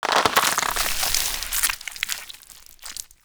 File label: Infested Jetpack Excretion; Warframe